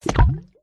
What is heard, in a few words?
cartoon plug water plug in or remove